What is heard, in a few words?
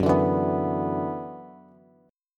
Gb 7th Suspended 4th Sharp 5th Gb down Bass; strummed; JGuitar